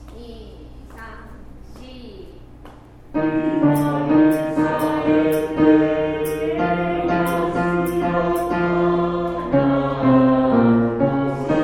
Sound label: akogare baritone; 憧れ